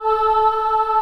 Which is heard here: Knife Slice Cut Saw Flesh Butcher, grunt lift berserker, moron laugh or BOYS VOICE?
BOYS VOICE